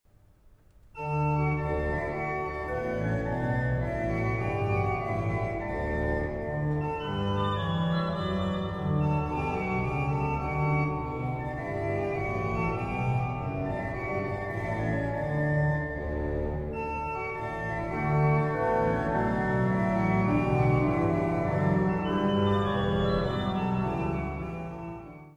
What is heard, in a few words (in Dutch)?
Orgelpartita Psalm